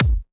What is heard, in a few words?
kick